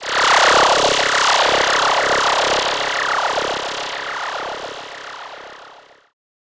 Laser Sweep; FREE SFX PACK VOL 1

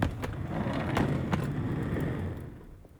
Chair Roll; Micro Pack

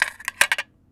Small Crushed Can - Clatter; Micro Pack

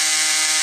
machine; 30 cc0 sfx loops

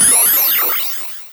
Retro Alien Transmission

